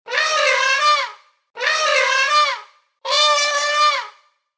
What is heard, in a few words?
elephant